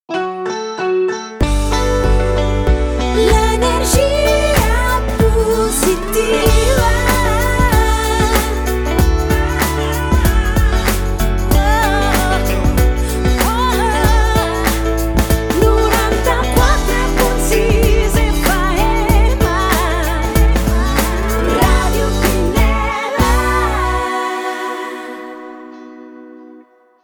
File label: Slow